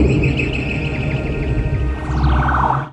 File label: Ambient FX, Ambience FX